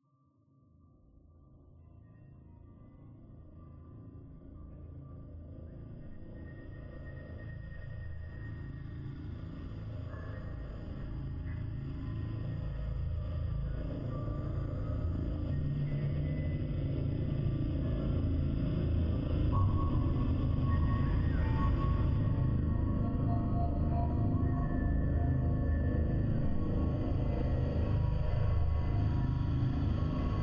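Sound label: Audio Drone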